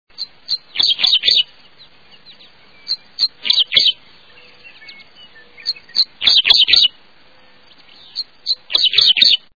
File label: Dickcissel, songs